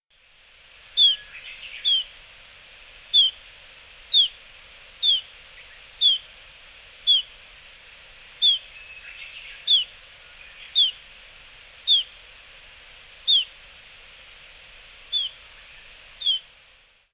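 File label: Eastern Crowned Warbler; hyogo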